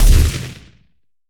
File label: Sci-Fi Weapons-Assault Rifle-Single Shot